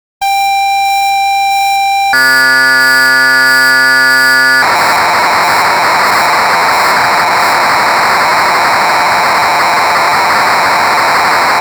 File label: Korg DW-8000 Preload B Tape Dump